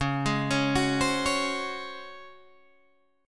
C sharp Major 7th Suspended 4th C sharp Guitar Open G